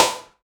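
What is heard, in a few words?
tight drill snare one shot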